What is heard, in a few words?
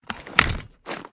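g36 reload end; daffas arsenal; tacz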